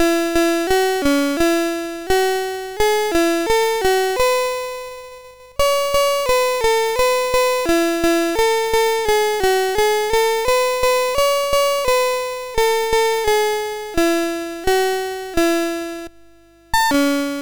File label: sid MOS6581R2